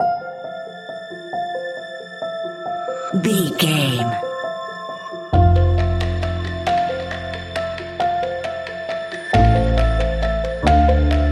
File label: sentimental uplifting piano and strings loop